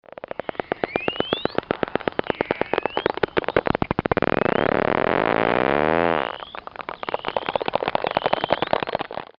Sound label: atlantic spotted, sounds of the sea